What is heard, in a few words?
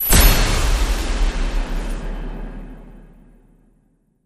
Hit Bolt Broken Bell